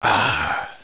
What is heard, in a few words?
aah; tnpdemo